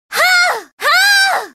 yell attack

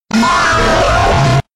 jumpscare; the escape from pizzeria

